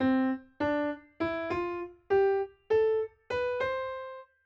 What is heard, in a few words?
major scale